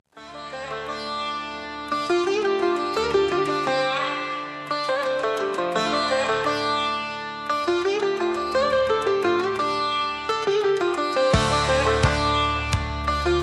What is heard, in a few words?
Asian Rhythms